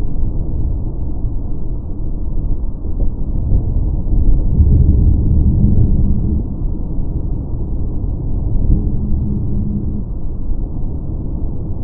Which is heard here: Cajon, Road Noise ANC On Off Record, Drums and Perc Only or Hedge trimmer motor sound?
Road Noise ANC On Off Record